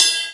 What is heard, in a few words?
normal hit whistle